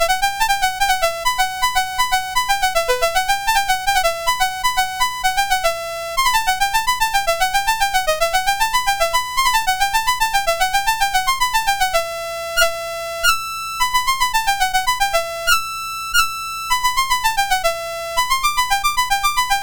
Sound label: Piano BASIC, MOS6581R4